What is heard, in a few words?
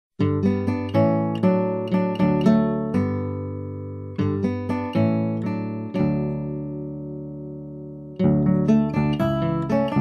Guitar